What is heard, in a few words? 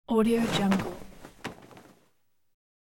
towel pull off from rack